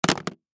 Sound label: Storage Bin Small, Storage Bin Case Drop Cement; Drops; Foley; Drop Sound Effects; Objects